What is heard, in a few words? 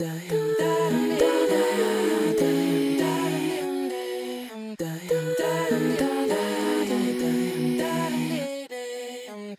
Effected Vocals Chorus; Multitrack